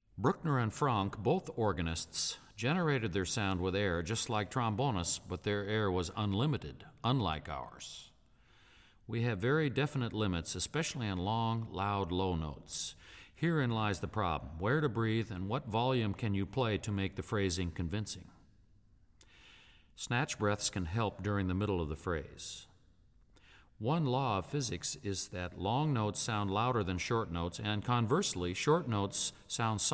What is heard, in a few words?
Symphony in D Minor, Orchestral Excerpts for Bass Trombone, Tenor Tuba, Bass Trumpet